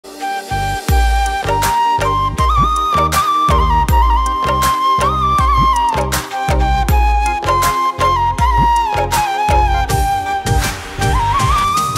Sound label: bansuri ringtone, flute ringtone, saraiki music